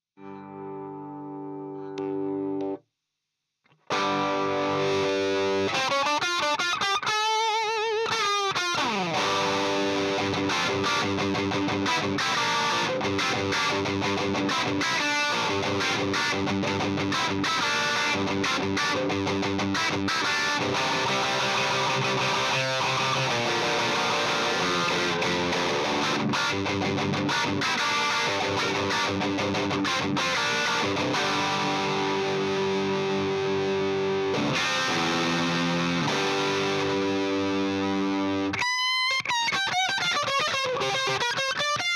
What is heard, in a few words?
Bright input humbucker